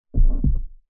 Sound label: household movement thud low frequency as if next door upstairs or through wall